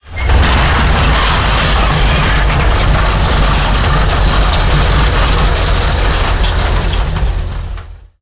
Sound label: turn rattle, tanks; War Thunder